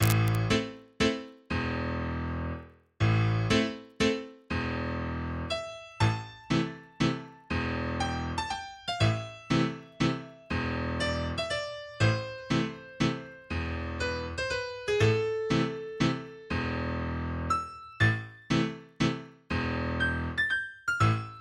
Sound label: Hungarian Waltz